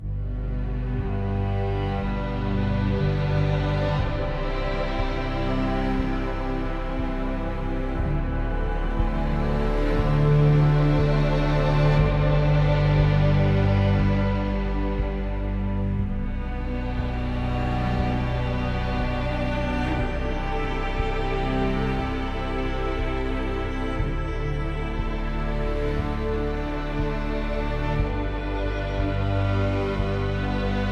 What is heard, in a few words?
Adoration Ambient